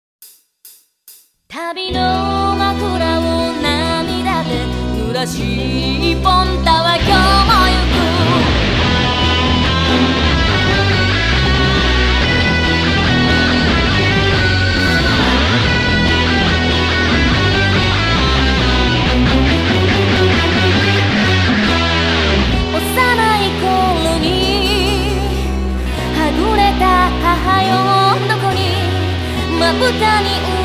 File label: Drum Minus Track